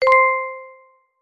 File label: multimedia notification bell chime ping tone